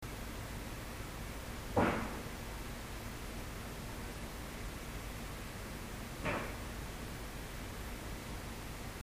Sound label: bang